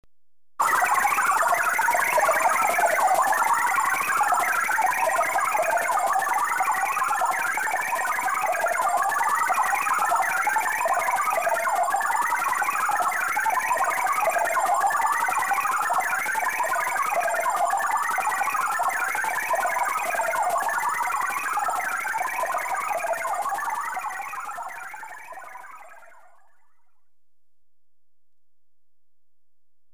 Rapid Chattering Computer, Electronically Generated Sounds; BBC Sound Effects Library